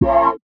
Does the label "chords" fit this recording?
Yes